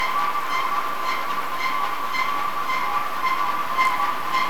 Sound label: ship